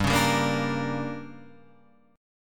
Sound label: D Major 7th Suspended 4th Sharp 5th, chord